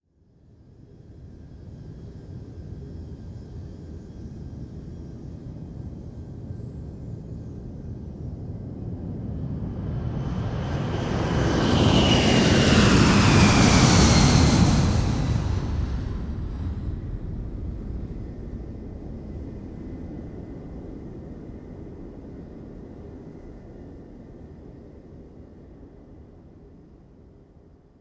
Jumbo Jet Takeoff; Jumbo Jet Takeoff 02

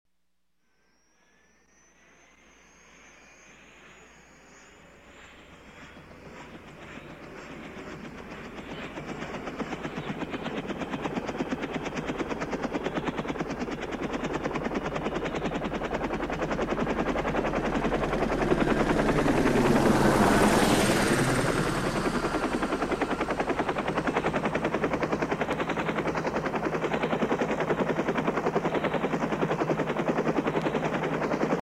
Helicopter Start Up

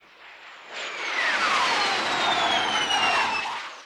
MIG Sweetener; Vehicles; Aircraft

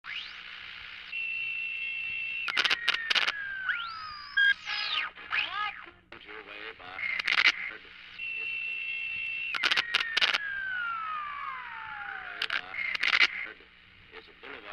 Tuning A Radio

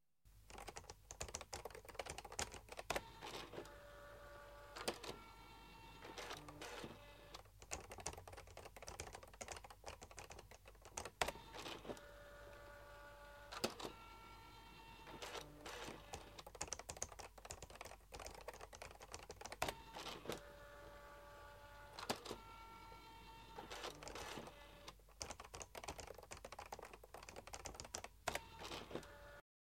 Electric Typewriter

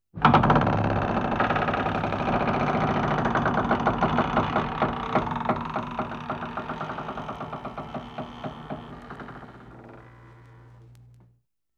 Creaking Door

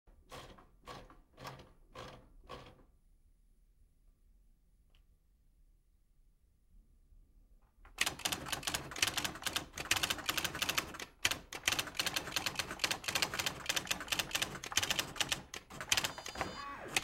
Old Typewriter

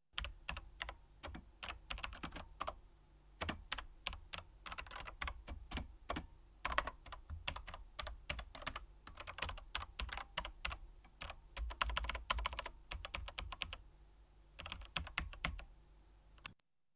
Computer Keyboard

